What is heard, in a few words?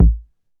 tight lows kick drum sound a key, bass drum single shot tuned to the A note; kick drum samples